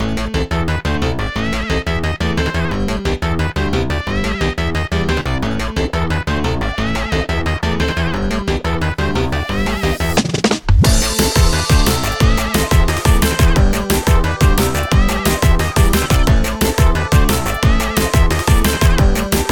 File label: Instrumental; Elastic; Friday Night Funkin' Schwiftying on a Friday Night Linux macOS Windows gamerip 2023